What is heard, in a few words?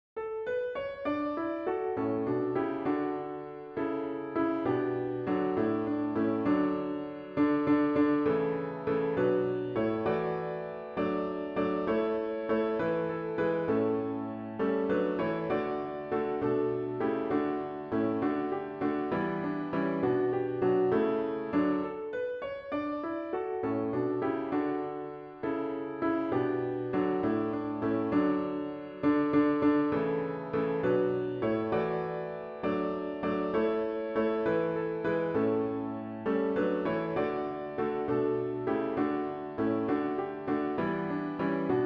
People Look East piano with Intro